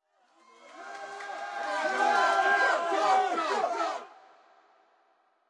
Trial Complete Crowd Cheering Incoming; Subway Surfers City

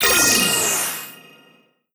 Research Start

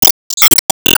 Enemy Shield Guard Break; Se Enemy Shield Guard Break

